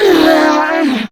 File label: Death, Female; Warframe; Grineer Female; Battle Barks